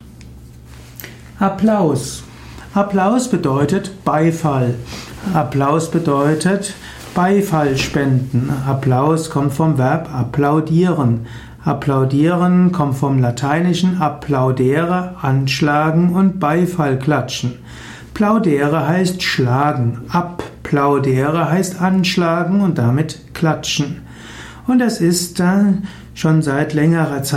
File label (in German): Applaus